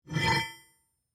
Metal Weight Up, Foley, Metal, Weight, Pick, Up, From, Metal, Drag, Slide; Pick Up Sound Effects; BlastWave